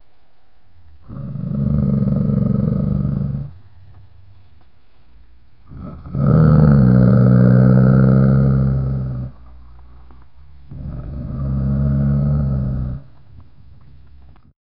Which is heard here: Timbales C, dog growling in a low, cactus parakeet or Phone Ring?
dog growling in a low